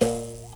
MOUTH BOW HI, Heart of Africa

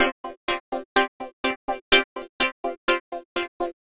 Synths; Underground UK House & Electro; Sonic Foundry (Sony Creative Software)

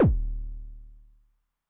BD MOD [Pitch+Depth], 909mod, BASS DRUM

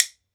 WOOD BONE, Ireland; E-MU Producer Series Vol. 7 – Old World Instruments

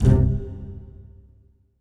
PIZZ, Dance Mega Synth

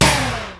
Snare, Alesis D4fx

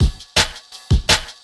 Beat, Futurist Drum & Bass; Drums & Percussion

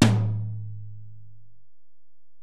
Tom, Alesis DM-PRO

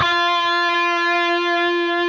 PRS F#, Guitars, LEAD

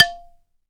BALA, Balaphone

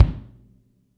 3 qtr damped kick p, deep house single hits

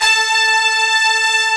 brass A4

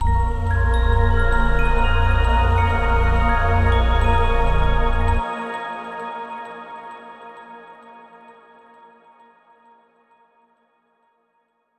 CHIMES WITH BASS CHOIR

